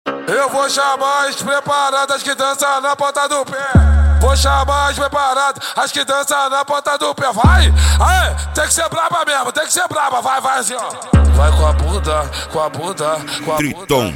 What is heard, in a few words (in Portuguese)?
alto falantes especial funk mix